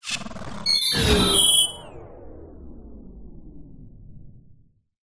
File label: science fiction robot failure glitch shutdown